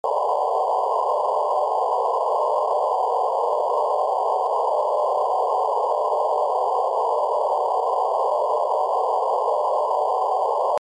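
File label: 600 Hz bandwidth only low knee; 600Hzbw lowknee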